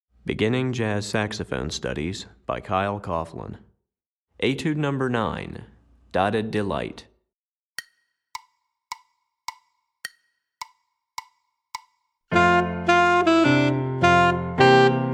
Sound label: Alto Etude, Saxophone